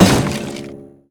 Cello Smash; SFX Kit; Foley - Misc

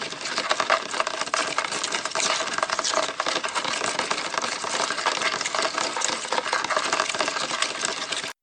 Rats; Inquisitor 3

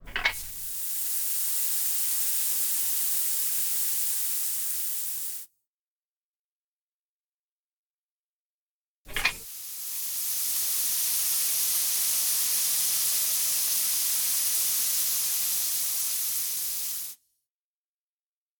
freeze walk; Apotheorasis

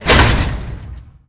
Hatch Large Metal Lock; Doors

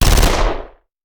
Machine Gun; Sound Ideas; Guns

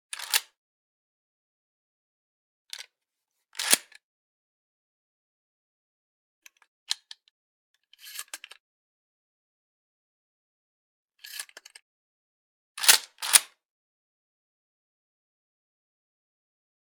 12 Gauge Shotgun Load; Hollywood Edge; Heavily Armed

